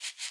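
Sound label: Special Photo Crayon Environment Draw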